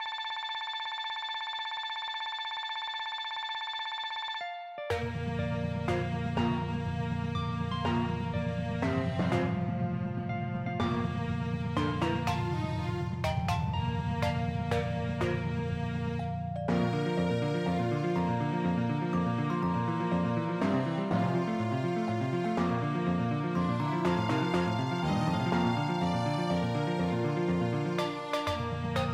anthem

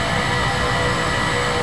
A-10 engine; A-10 Attack! Cuba

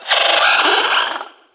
eel hit; DAoC

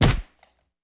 gen hit; Revista CD Expert 45; ZEUS

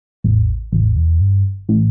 SNTH BASS HOUSE 125 A; eJay

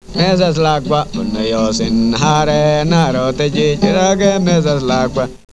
Africa

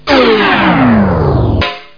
beam

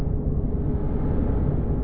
Shield; machines

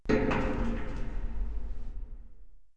player duct; Group Mapping Project

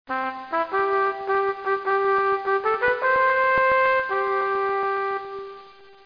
Anthem

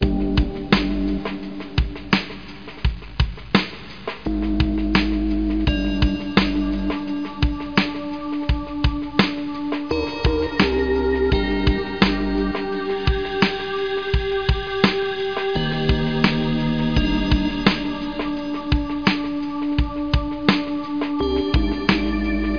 PAD, ambient

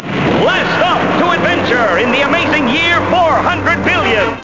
BLASTOFF

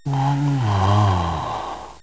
Wakeup; Treasure Galaxy (1994); Learning Company

